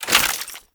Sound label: Bayonet Melee Whoosh Gear Accent; Warframe; Tenno